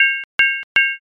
coin insert

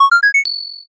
heal

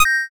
saved

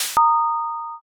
block clear

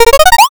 picoquestion

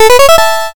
level up

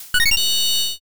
all clear

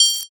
vs card

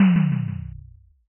impact text

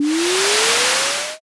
wind magic

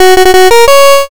fanfare

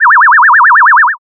ufo

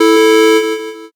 buzzer end

